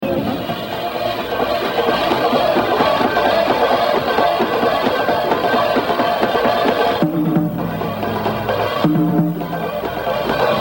Hi-Hat Sol; Etude 6A; Jo Jones Hi-Hat; The Evolution of Jazz Drumming (Gottlieb)

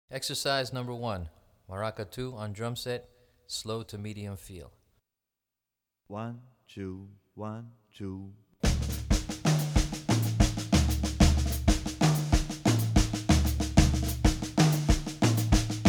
Maracatu on Drumset; Brazilian Rhythm For Drumset; DaFonseca, Weiner

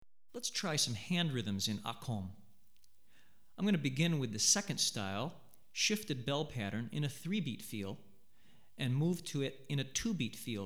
Hand, West African Rhythms For Drumset; Akom; Hartigan

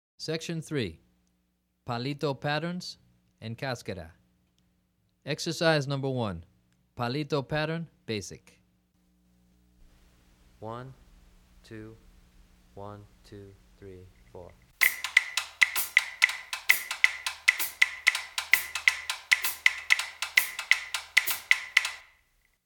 Palito Patterns and Cascara, Drumset

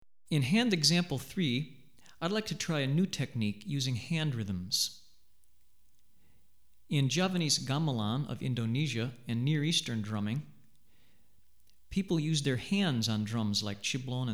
Sikyi Hand, Drumset; West African Rhythms For Drumset; Hartigan

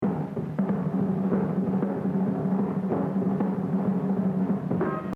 Exercise 10, Jazz Drumming - 100; Gene Krupa; The Evolution of Jazz Drumming (Gottlieb)

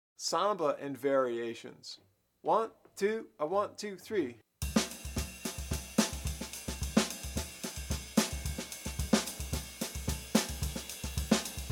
Samba, Bop Drumming; The Art Of Bop Drumming; Riley

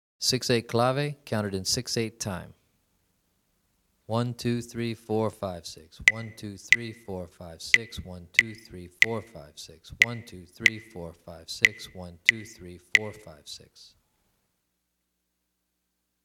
6-8 Clave Pattern, Drumset

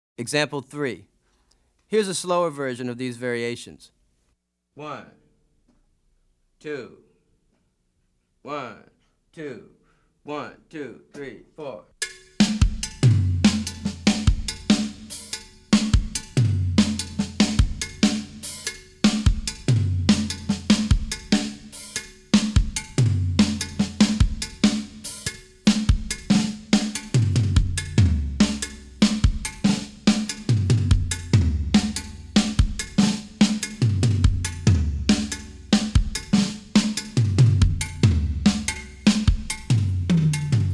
Afro-Cuban Grooves For Bass And Drums; Afro-Cuban Grooves For Bass And Drums (Goines, Ameen); Drummer version

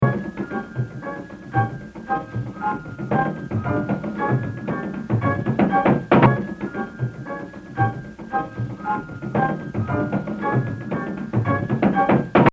Exercise 3, Jazz Drumming - 100%; Baby Dodds; The Evolution of Jazz Drumming (Gottlieb)

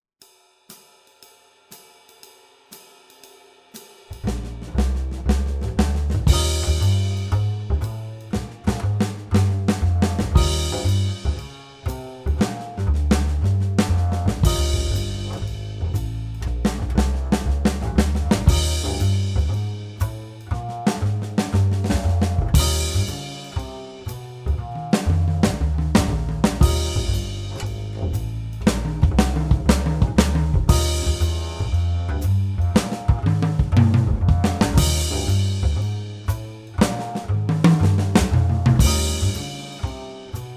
Swing, Drum Fills; Essential Drum Fills; Erskine

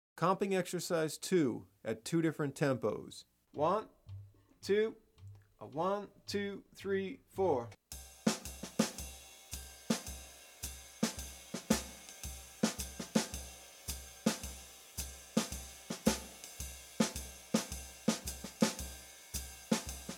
Comp Ex. Slow, Bop Drumming; The Art Of Bop Drumming; Riley

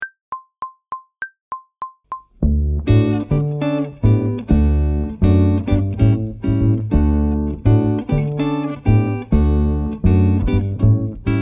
Salsa 200bpm, brushes; Phrasing and Freedom with Brushes

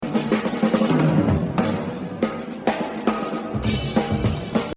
Jazz Drumming

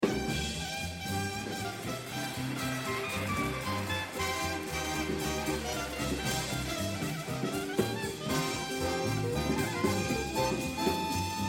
Blues, jazz drumming; The Evolution of Jazz Drumming (Gottlieb)

